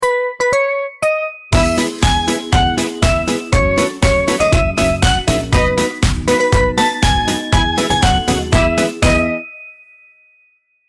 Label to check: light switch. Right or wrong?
Wrong